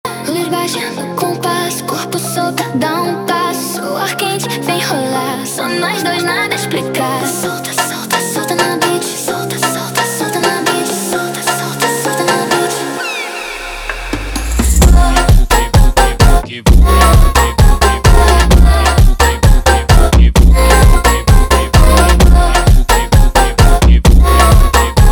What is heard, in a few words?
funk